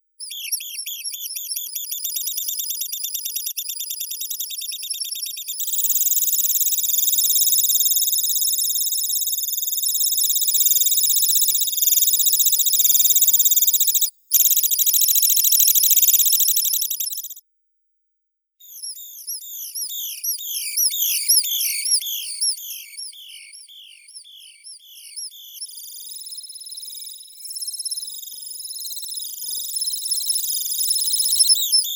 pesochnik belohvostii calidris temminckii; zvuki prirodi

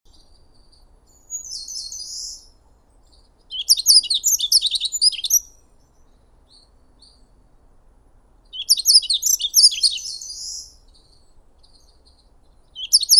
ovsyanka zheltogorlaya emberiza elegans; zvuki prirodi

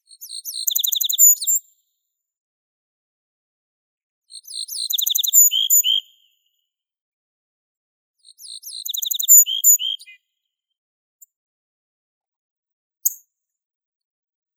ovsyanka kroshka emberiza pusilla

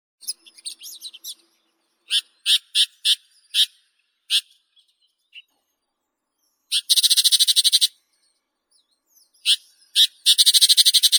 zvuki prirodi sorokoput tigrovii lanius tigrinus

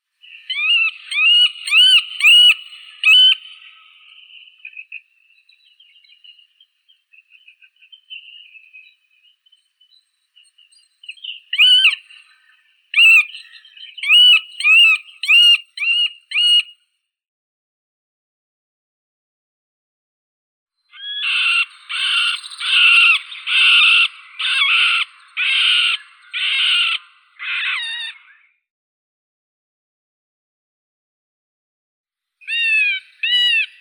dyatel srednii pestrii dendrocopos medius

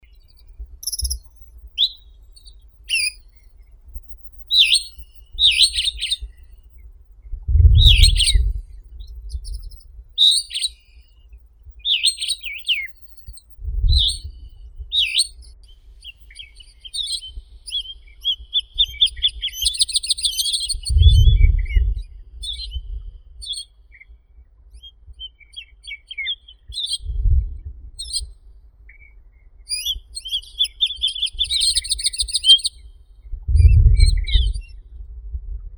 chechevitsa bolshaya carpodacus rubicilla

